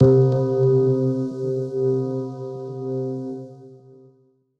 Piano 3 - C; 99Sounds; Monosounds; Music Loops; Instrument Oneshots

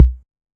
Kick, 606; classic machines

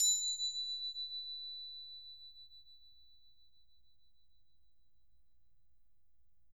Bell H, BELLS; Roland MV8800

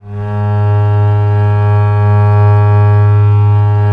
VL G#1, VIOLE, Historical Instruments; USB Soundscan vol.23

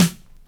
SD, dance drums hip

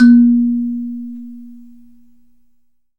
KALIMBA, ETHNIC; AKAI S-Series CD-ROM Sound Library VOL-8

